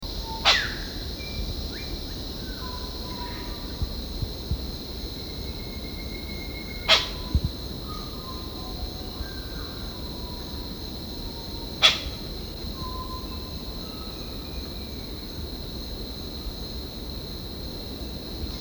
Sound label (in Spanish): cantos sonidos Manucodia comrii, aves del paraiso